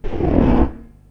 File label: doors